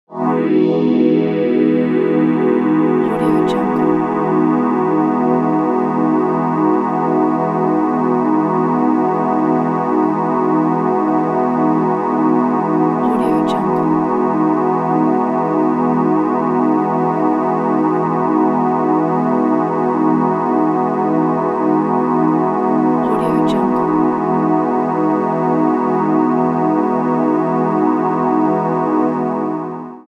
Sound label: atmo pads morning air; smartsoundfx